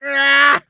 hit; Escape from Tarkov; scav6

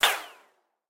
bullet ricochet; War Thunder

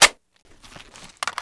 reload clip out, foley; skorpion

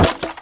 Wood Shield Drop; Civ4 warlords

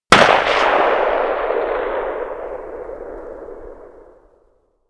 Inf Weapons; Red Orchestra 41-45

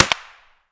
wood distant; Escape from Tarkov

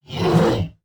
attack; Rust

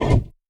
P-51D Cockpit Canopy Close End; DCS

